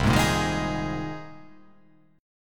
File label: C Minor 7th Sharp 5th; down; chord shape